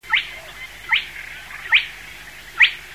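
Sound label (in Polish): kropiatka, chrusciele rallidae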